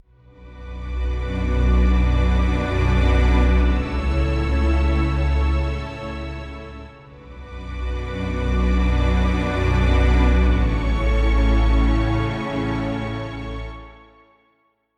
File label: Hopeful Short Orchestral Strings Phrase